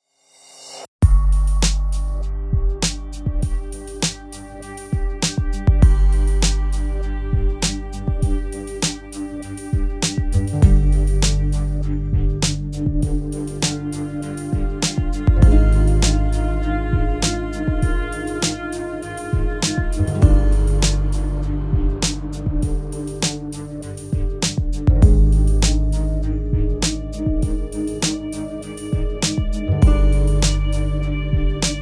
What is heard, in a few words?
Tense Drive slow